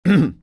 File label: Hrrmmm; Frank; Mafie 1 cz